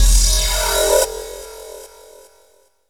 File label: D+B